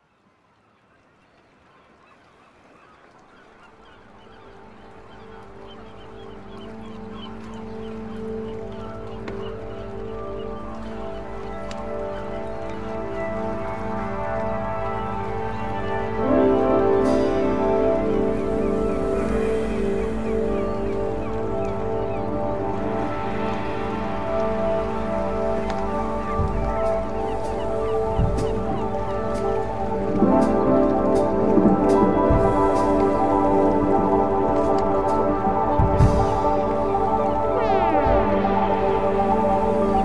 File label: Drum Art; Oceanside